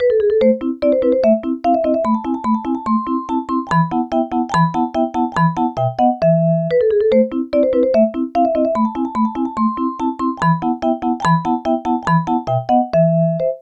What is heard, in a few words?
vibraphone